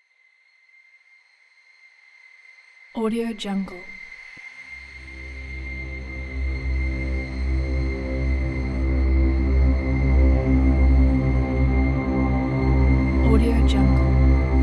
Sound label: ethereal scifi ambient